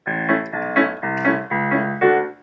A B C Db D bass and chords, simple piano recordings